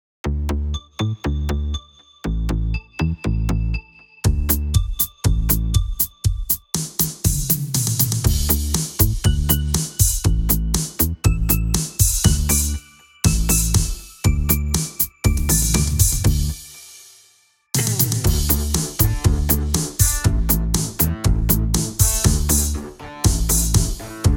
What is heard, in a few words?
jingle